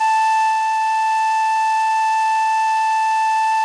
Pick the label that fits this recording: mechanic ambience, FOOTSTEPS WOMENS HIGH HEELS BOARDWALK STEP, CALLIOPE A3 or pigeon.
CALLIOPE A3